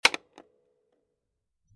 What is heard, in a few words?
single key press